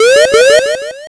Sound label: warning